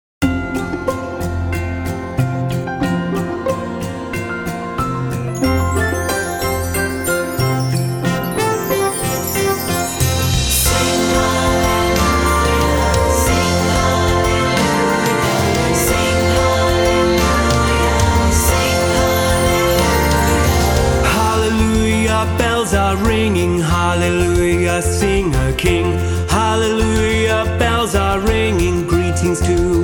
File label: Bells are ringing